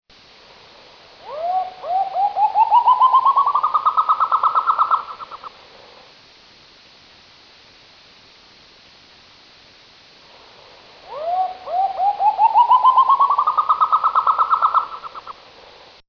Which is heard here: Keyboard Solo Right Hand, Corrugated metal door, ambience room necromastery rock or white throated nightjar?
white throated nightjar